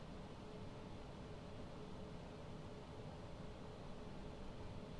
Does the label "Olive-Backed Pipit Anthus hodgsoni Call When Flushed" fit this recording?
No